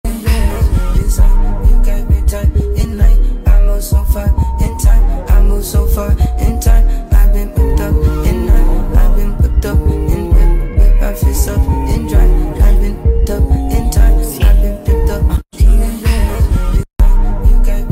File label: Trance